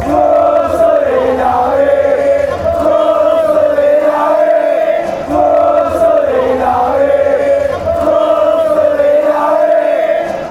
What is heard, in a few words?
all boys chant